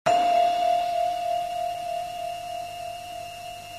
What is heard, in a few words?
piiinnnngggg!; Goldorak CPC